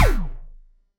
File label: shoot blaster